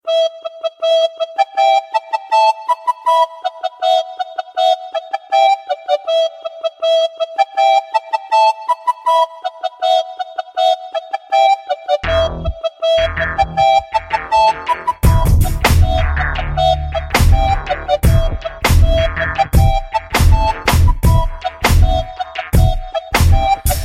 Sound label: Piano casio mix